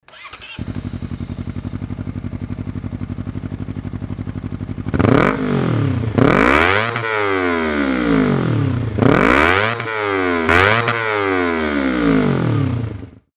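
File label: cbr250rr, motorcycle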